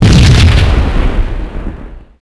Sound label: Explosion Large; Max Payne